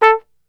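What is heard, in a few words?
A2, flugelhorn, brass; Best Service; Brass Super Section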